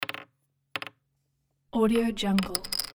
nervously dropping casino chips on casino table

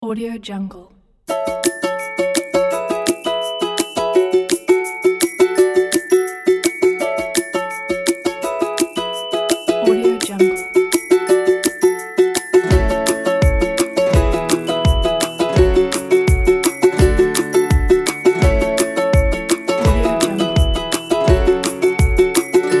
happy

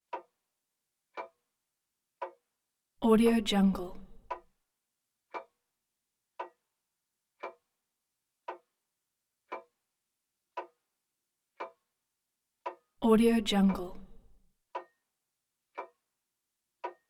clock mantle; prosoundfx

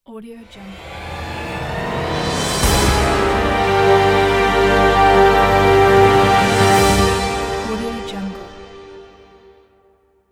dark logo

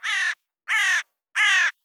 bird crow

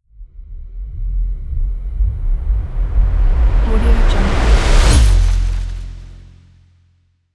riser

